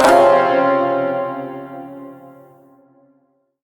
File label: Shawzin Phrygian Chord D; Warframe